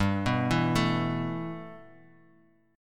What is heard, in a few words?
G 6th Add 9th G, chord; G6add9 arpeggiated